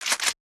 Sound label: kolibri weapon load; CrossCode; battle; colibri